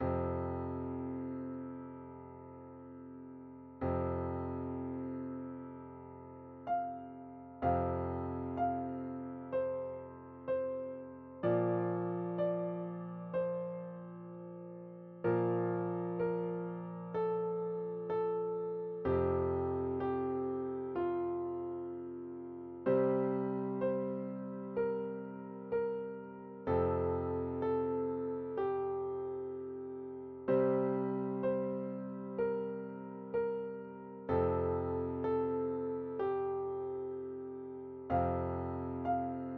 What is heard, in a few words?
twinkle star healing, calm piano